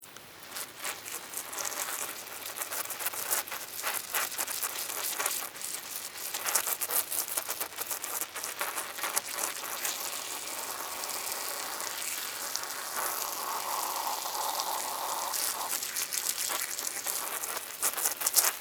Pesticide Spray, Extermination, Pesticide, Spray, Insect, Bug; Sound Effects:Animals:Insects; Blastwave